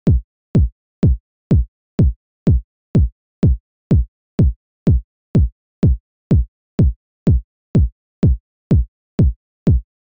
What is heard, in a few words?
EDM Kick; Domino; Extreme